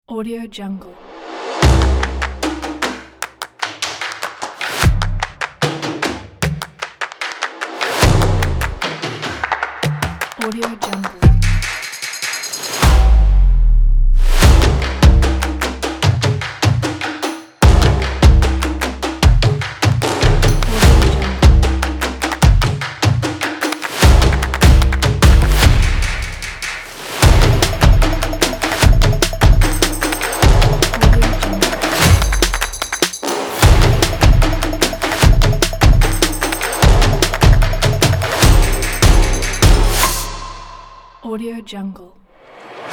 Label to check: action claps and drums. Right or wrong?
Right